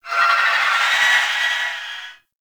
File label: Eye Projectile; Ambience; Gameplay; Warframe; Orokin Moon